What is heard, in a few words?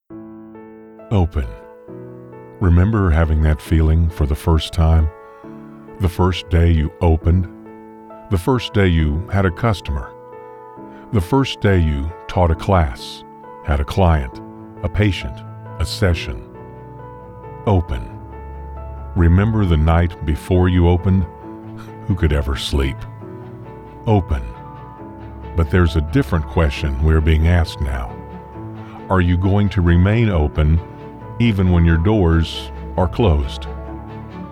Open music